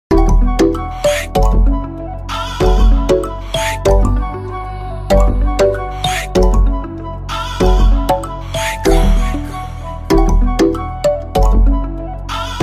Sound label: Afro Dancehall Instrumental